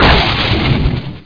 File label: slide; skrapsw